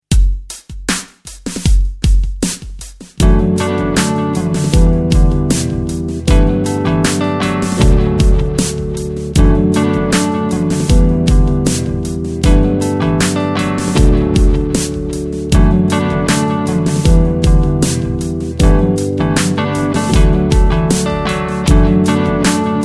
goodbye guitars

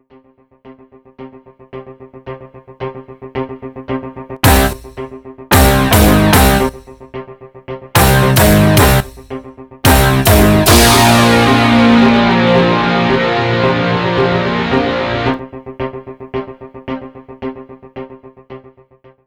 rock audio logo

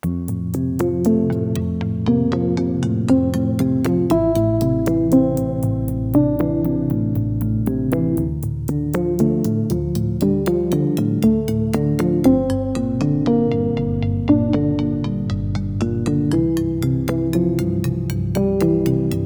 supersweet analog

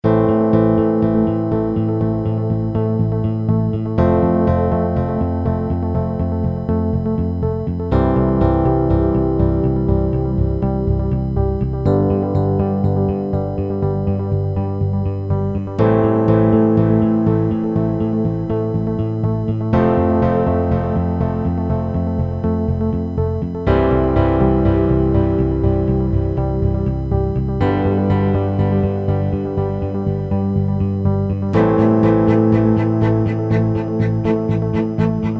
corporate trailer

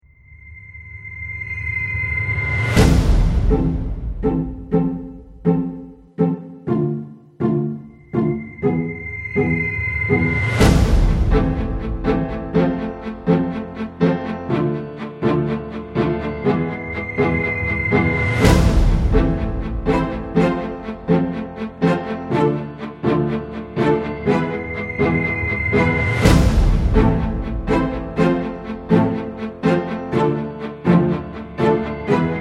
space strings; space strings sfx

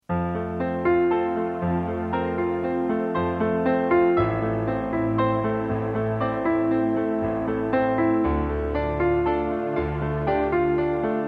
supersweet piano

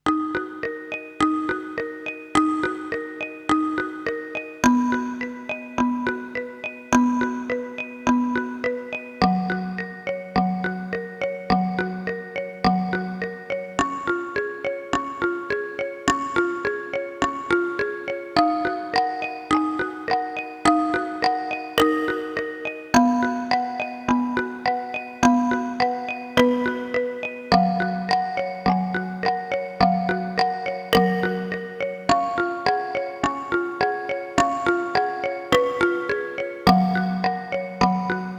bittersweet kalimba

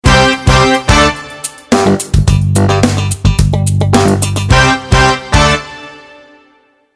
funky logo

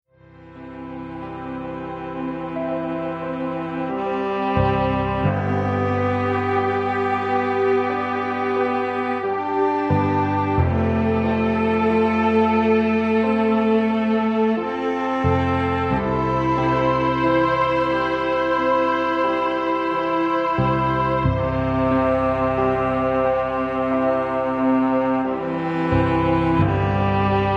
cello lounge

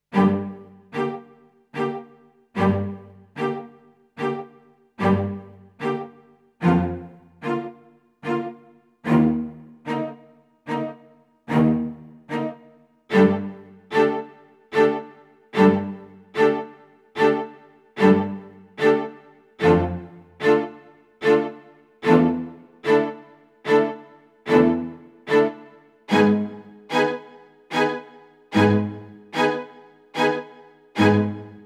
minimal strings

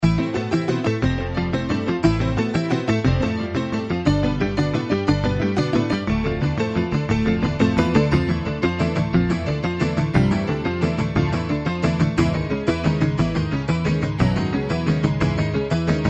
excited piano

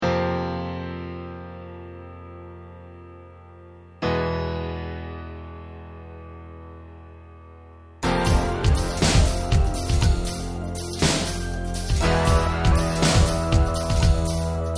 thriller ambient

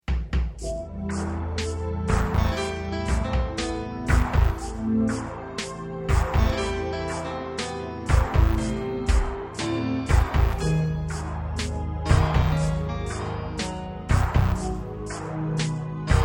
relaxed piano

